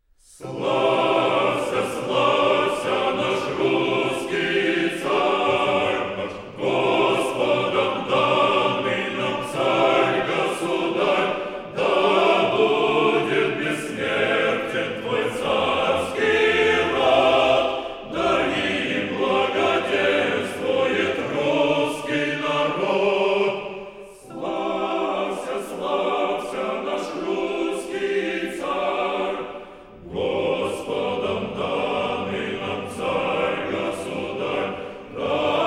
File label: Choir, Male choir; Valaam Institute